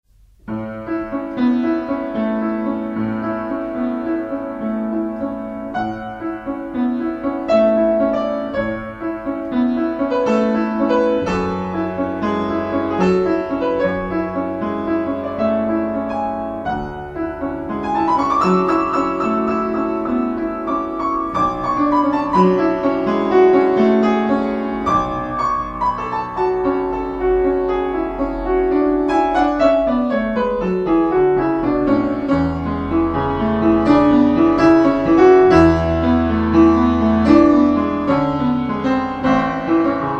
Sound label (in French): PRELUDE EN LA MAJEUR